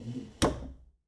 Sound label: Foosball Goalie Slide